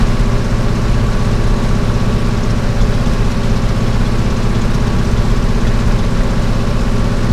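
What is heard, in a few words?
hellcat cockpit right, engines; War Thunder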